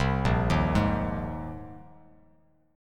Bb 7th Suspended 2nd Suspended 4th Bb, Bass Standard; Bb7sus2sus4; arpeggiated; 8,1,1,1; JGuitar